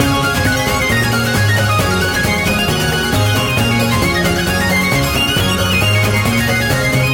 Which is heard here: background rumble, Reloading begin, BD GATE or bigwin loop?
bigwin loop